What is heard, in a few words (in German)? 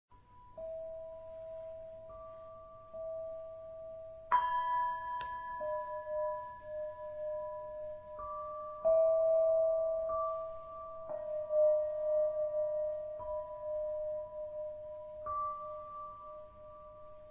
gongs